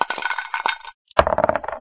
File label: dice; RS Games Sounds; Shut The Box